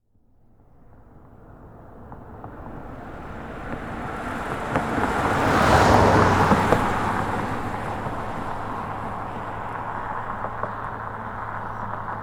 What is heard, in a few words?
Auto Pass By Car Automobile Follow; Cars; Vehicles